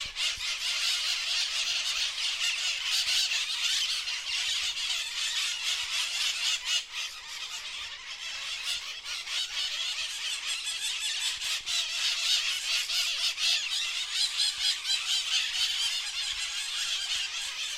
Psittacara leucophthalmus Periquitão

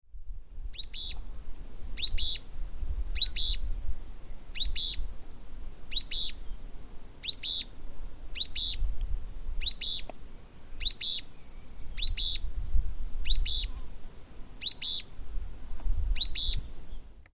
Synallaxis albescens Uí pi

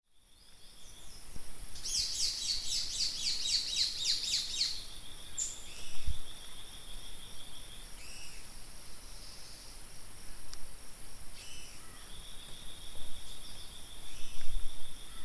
Anabazenops fuscus Trepador coleira

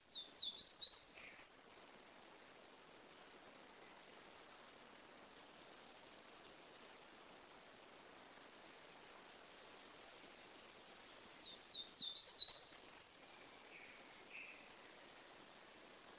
Basileuterus culicivorus Pula pula

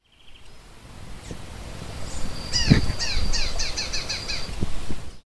Mionectes rufiventris Abre-asa-de-cabeça-cinza

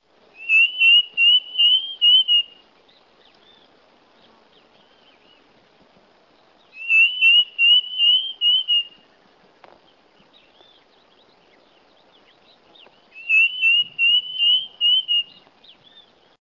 Tapera naevia Saci

